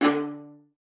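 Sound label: D5, viola spiccato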